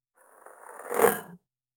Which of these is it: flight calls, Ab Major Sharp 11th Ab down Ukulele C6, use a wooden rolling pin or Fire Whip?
use a wooden rolling pin